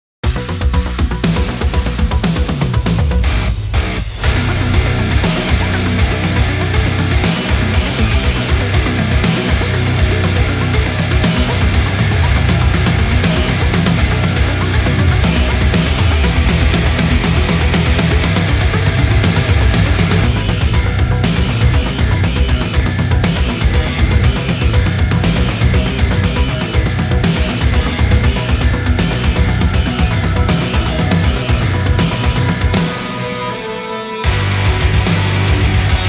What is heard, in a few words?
uranium drum kit; polarity audio